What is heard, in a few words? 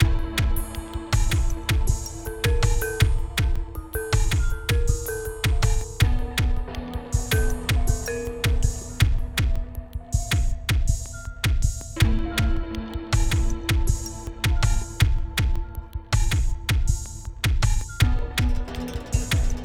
Music Gameplay State Neutral; Sifu; H4 Level Area=Office Escape End; Office2Ten